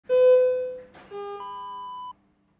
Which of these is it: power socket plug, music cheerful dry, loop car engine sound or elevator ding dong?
elevator ding dong